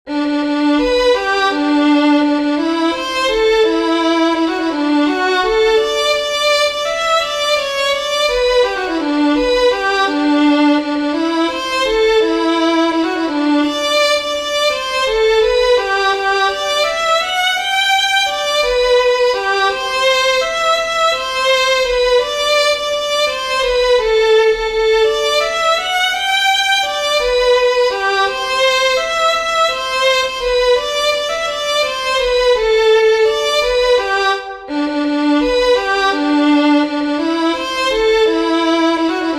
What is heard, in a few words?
fiddlers; southern kings fiddlers